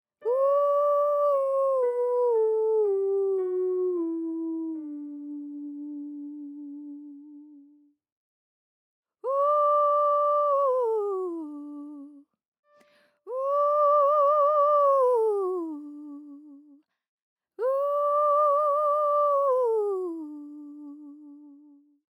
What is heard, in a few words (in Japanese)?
uragoe